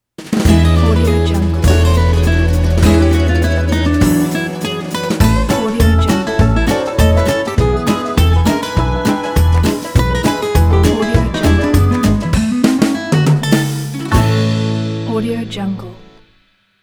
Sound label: logo jazz retro